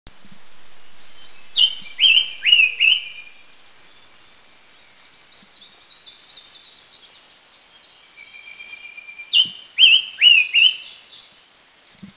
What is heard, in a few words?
Silver-eared Mesia; Mishmi; India